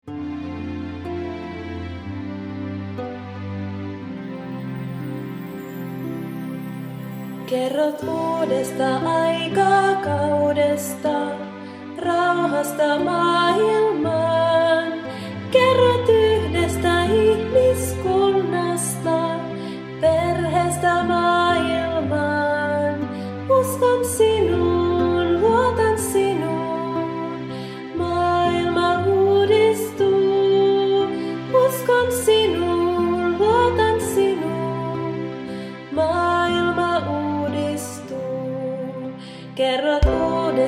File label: Song, FI